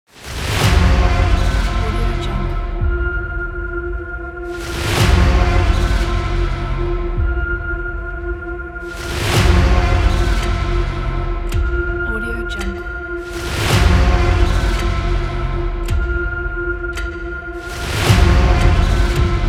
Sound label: intense countdown trailer